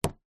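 vehicles windshield wiper release down on windshield exterior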